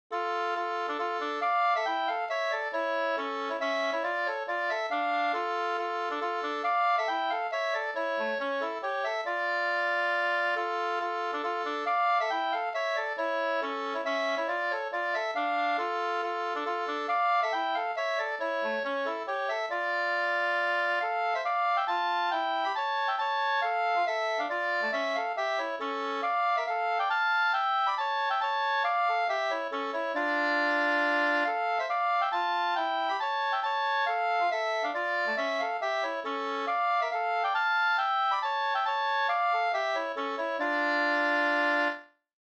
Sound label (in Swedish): mazurka, 2st